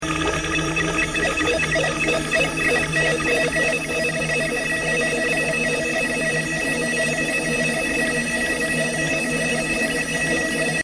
Metal stutter

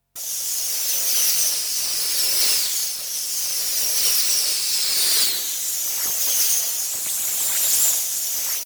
whizzing glissando

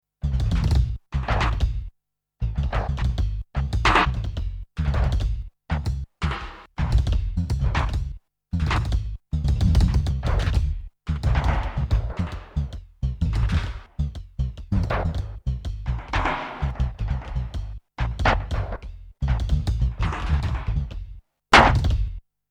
bubbling pops

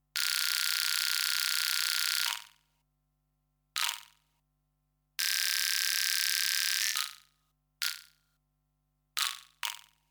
insect buzz

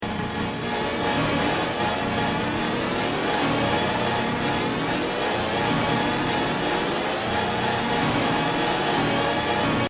carnival; asoundaday

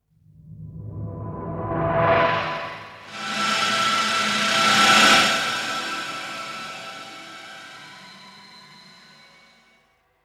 snare mist

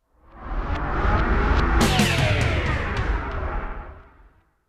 bi directional whip

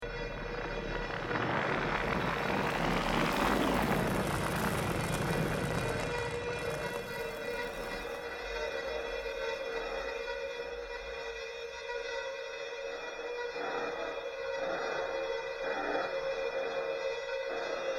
lava rain

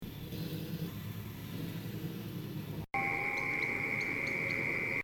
night factory

